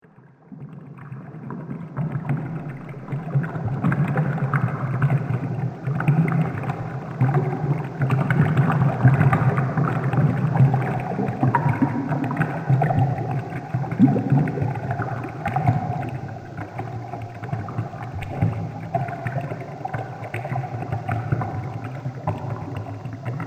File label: Fountain In The Dungeon; Water Sound Effects; fantasy sound effect